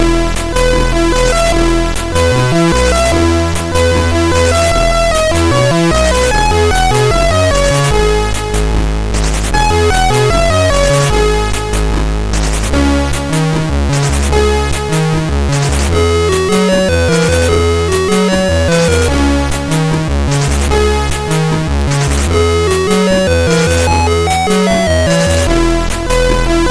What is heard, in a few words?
Old Favourites, sid MOS6581R3